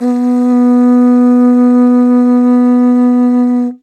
CONCH MID, World Percussion

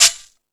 Percussion FX, Drum Machines

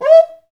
PRC XCUICA, Skin Drums

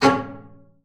C#4, BASS SPICC; Xsample VOL-5

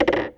Percussive Hit, contact mic

